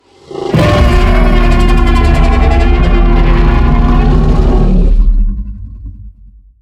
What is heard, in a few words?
Acrocanthosaurus sound